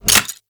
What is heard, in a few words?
Pump Shotgun Reload Start; Weapons; Warframe; Zariman